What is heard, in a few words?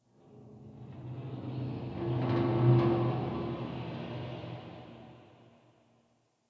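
Distant Metal Garbage Wronk; Warframe; Ambience; Nineteen Ninety Nine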